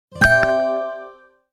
iphone sms alert

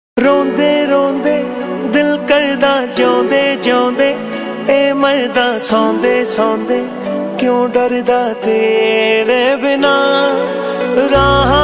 punjabi song

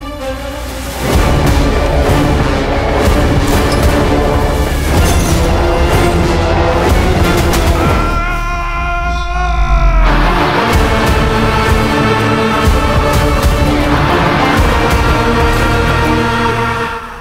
BGM; Vikrant Rona

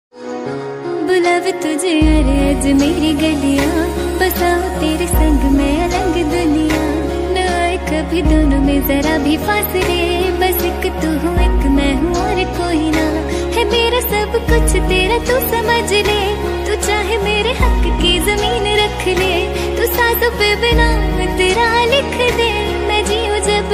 ringtone female version

